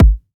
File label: kick